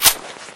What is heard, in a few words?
dual pistol slide forward; Left 4 Dead; Source Engine